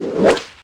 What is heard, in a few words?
whip; beppo